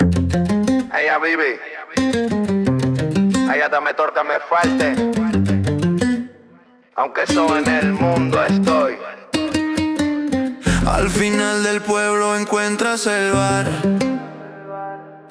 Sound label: reggaeton